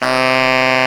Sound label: SAX T. GROW, SAX Tenor; Tenor V-sw